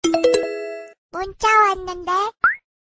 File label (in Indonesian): Nada Pesan Korea Lucu Nada SMS Korea Lucu